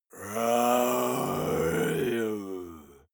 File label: monster growl unmotivated; kreaturen